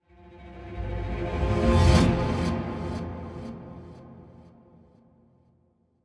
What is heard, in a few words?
Riser; Peak (2025)